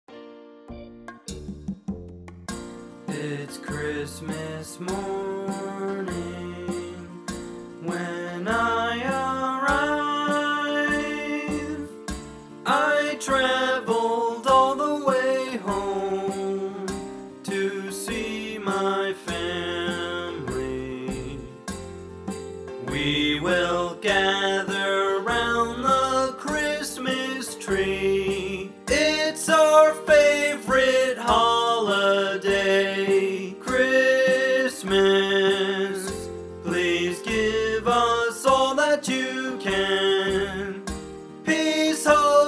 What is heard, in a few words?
Vocals; AUDION PRODUCTIONS